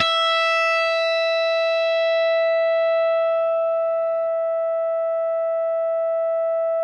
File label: solo N.H.